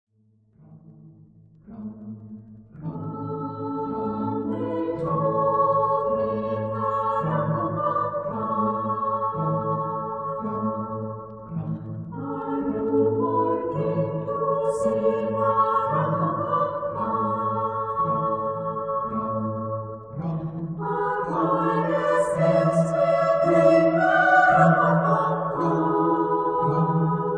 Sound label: Drum